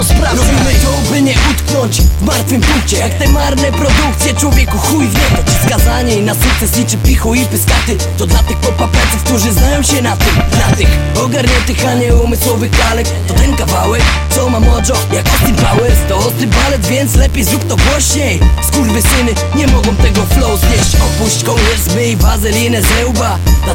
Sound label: Beat